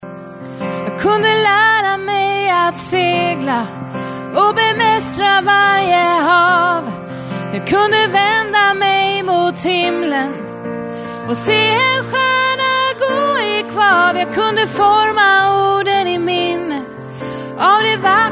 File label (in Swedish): sopran